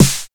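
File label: 909 SNARE; East West - Phat & Phunky CD2; 90's Sample CDs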